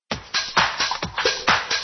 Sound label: synthesizer